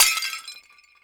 plate crash, ceramic plate drop and break

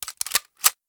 machine gun reload; submachine

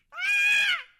lady screaming

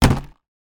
briefcase drop

